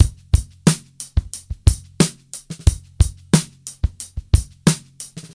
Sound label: 90 bpm drum loop sample d key, Free drum loop sample - kick tuned to the D note